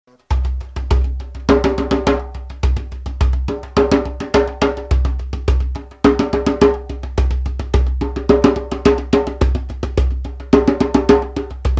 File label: Djembe